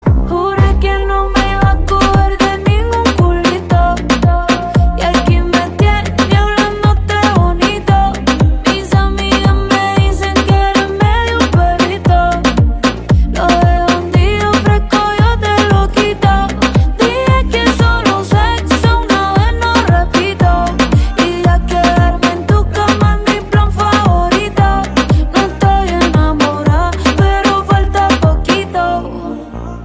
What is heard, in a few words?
On The Drums